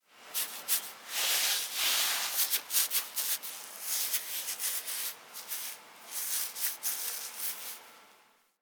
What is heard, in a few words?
foley interact scratch sleep forward; Enemies; Warframe; Catbrow